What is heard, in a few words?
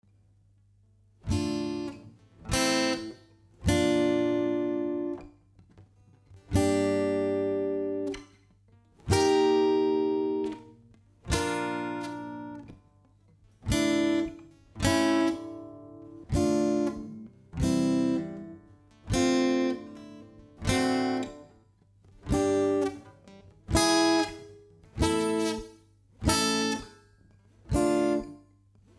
tristesse guitar chords